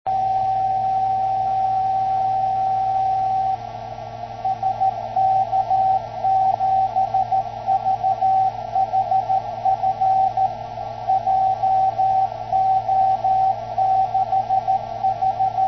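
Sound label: CW, 10W, 2xClover beacon, 144 MHz; SK7VHF/B JO65KJ 594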